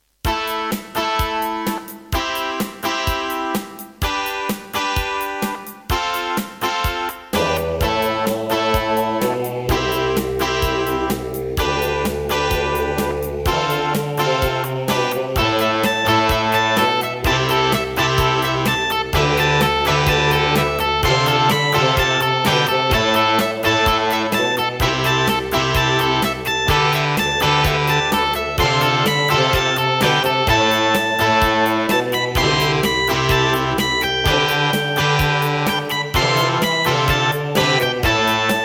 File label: Roland SD-50 GM2MODE; raptor call of the shadows